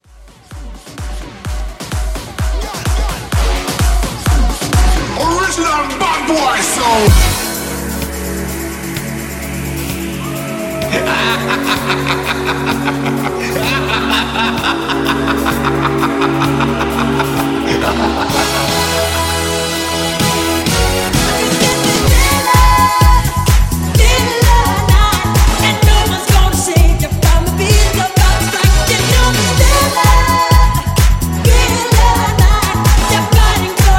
Halloween 128

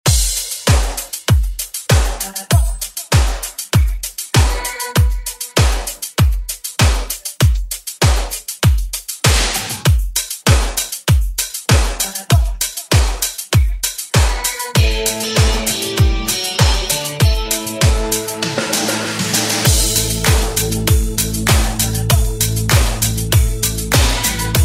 80s Club Re Work

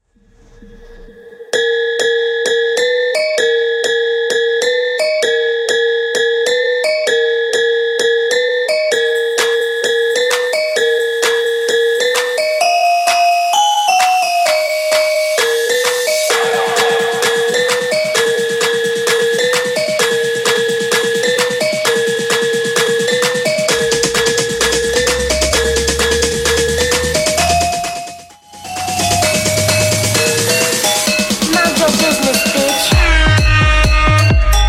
House

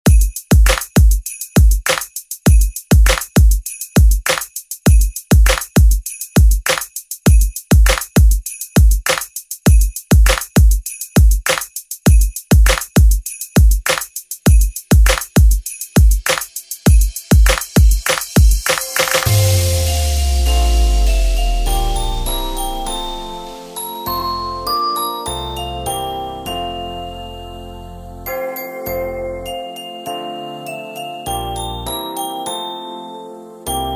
Instrumental; Deejay Hit; 12 Days Of Christmas

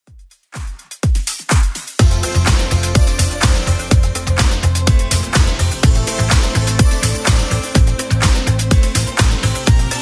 80s Club Edit 125bpm

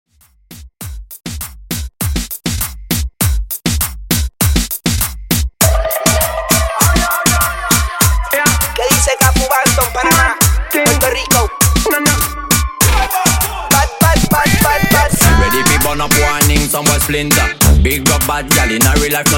In Outro Reggaeton 100Bpm

